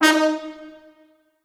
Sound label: D#3 L, Orchestral Brass